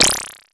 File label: TECHNO PERCS; Crypton - DrumBase5000